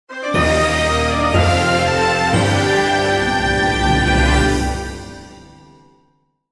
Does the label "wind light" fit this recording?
No